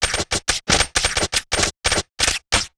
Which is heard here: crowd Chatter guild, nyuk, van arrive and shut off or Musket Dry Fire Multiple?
Musket Dry Fire Multiple